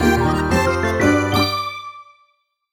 Jingle Fit Meter Connection Successful; wii fit u

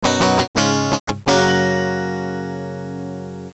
victory; Let's Play Journalists; NDS

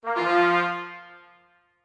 Battle Revealed; Shattered Throne

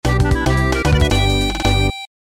Clear Neutral; Donkey Kong Country 4 The Kongs Return Demo 2

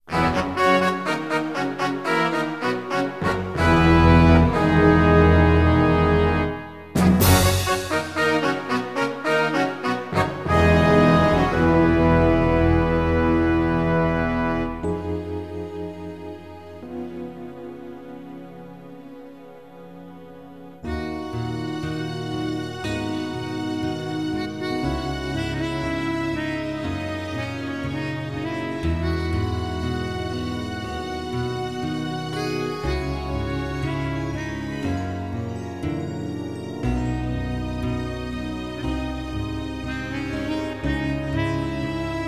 Blue Fanfare; blaze blade busters; ps1

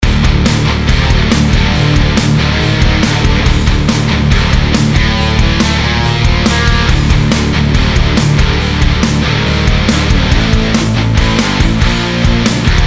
Hard Rock

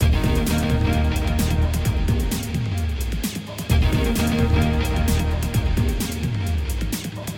BGM Result Success

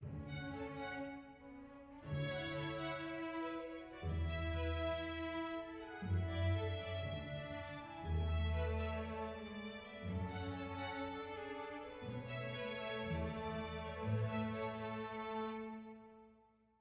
happy soft

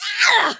Bail Female; Kelly Slater Pro Surfer; macOS, Windows gamerip

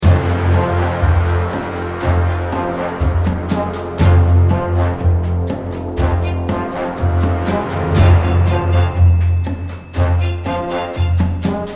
Battletune; Heroes of Loot 2

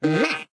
Cyborg Mouth open; cut the rope time travel; android

